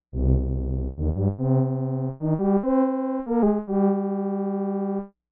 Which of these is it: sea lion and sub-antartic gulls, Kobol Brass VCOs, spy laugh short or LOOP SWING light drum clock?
Kobol Brass VCOs